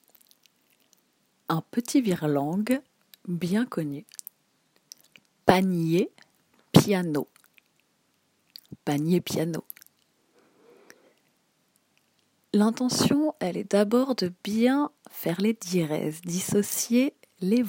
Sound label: Piano